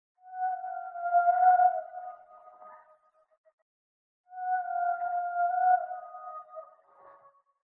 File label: siren; frozen 2; Disney